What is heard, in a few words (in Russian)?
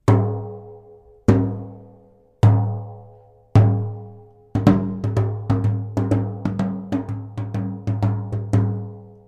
buben